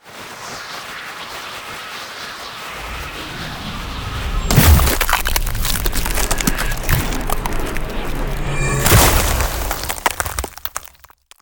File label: Ice Spell Ultimate Chill Of The Ice Age; Ultimate Ice Spells; Arctic Aura